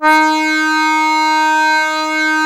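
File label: DIATO D#2, diatonic accordion, Historical Instruments; AKAI; USB Soundscan vol.23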